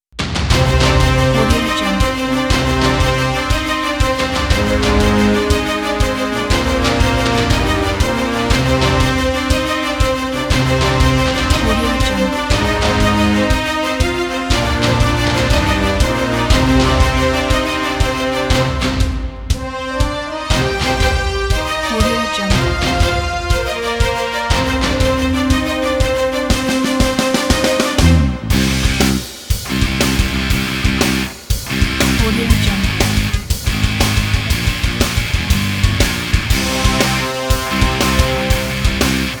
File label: epic battle rock; jerrywrightmusic